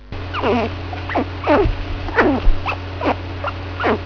chinchila nervoso

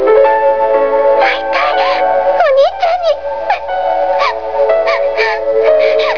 chorando

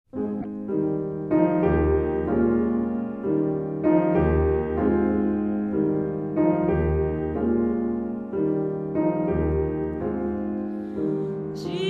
Toada

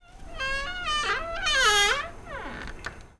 porta

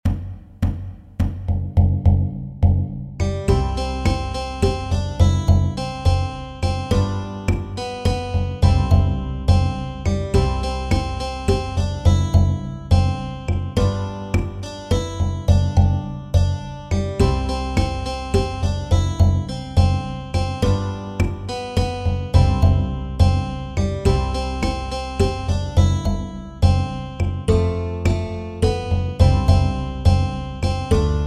Amor e Luta Jongo Lento

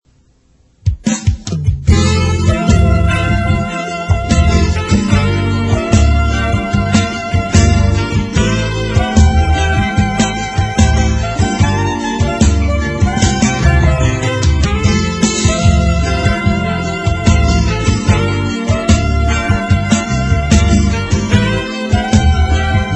Musica

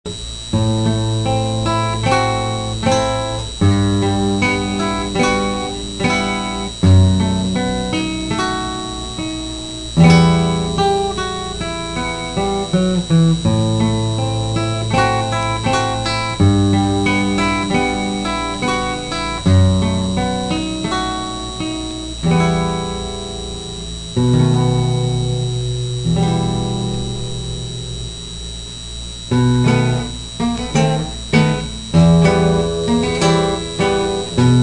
duas guitarras

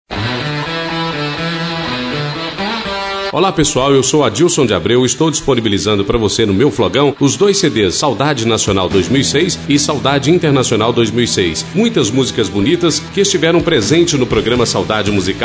Vinheta

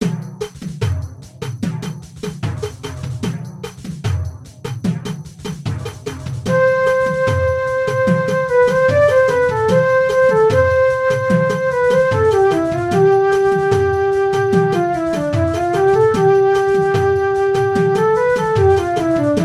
Canto do Pandeiro do Pontal

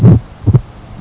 estenose tricuspide, sons cardiacos

